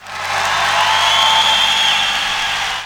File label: APPL, XP APPLAUSE; Best Service - XXL Production Effects